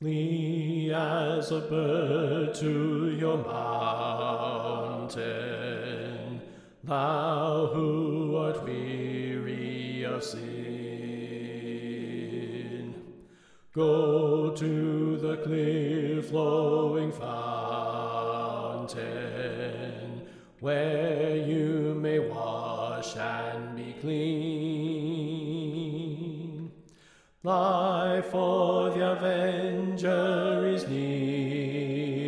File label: Bass Left